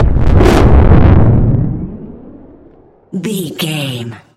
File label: sci-fi vehicle thrusters engage large